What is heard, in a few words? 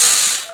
accel off; Nintendo